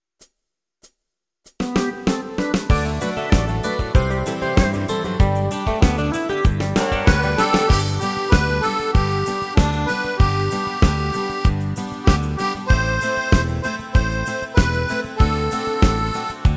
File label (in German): Schlusslied Playback